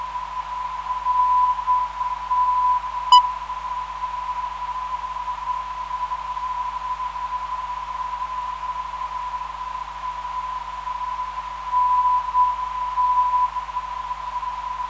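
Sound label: 352kHz, non-directional beacon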